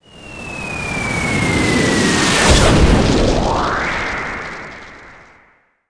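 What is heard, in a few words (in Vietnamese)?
tiếng tên lửa đạn dài bay kêu trong chiến tranh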